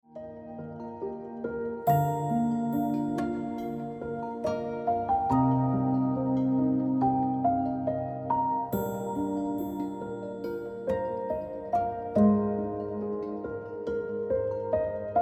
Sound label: Ringtone